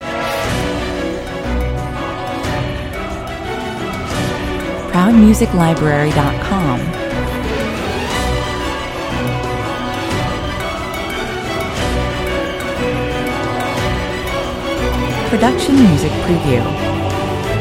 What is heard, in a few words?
with choir